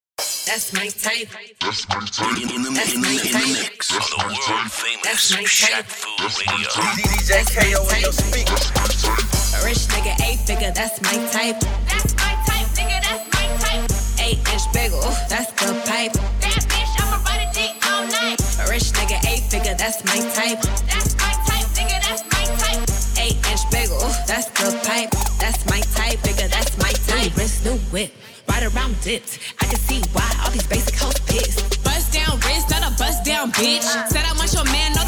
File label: HipHop